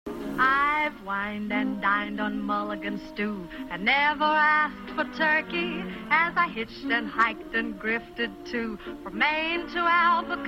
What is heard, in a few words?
ex of mid atlantic used in song